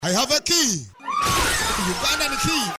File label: key; svencoop; FZSaySound